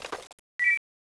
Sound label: draw, weapons; tfa cso